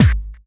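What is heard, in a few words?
snare drum bass drum, Dance Mega Drums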